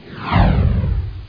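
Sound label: napalm fire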